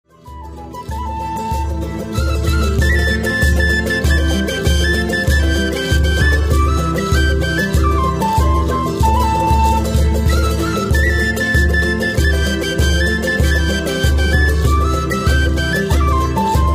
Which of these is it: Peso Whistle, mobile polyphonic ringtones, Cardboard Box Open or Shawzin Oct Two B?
Peso Whistle